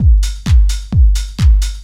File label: 4 floor beat, trance; Sonic Foundry (Sony Creative Software); Trance NRG VOL-2; Drums; Complete 4 On The Floor